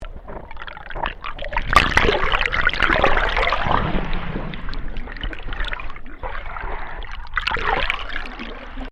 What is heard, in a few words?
Underwater Wave Large, Waves recorded underwater on Queensland's Sunshine coast.